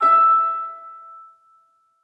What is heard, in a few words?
melody E5; fairymirror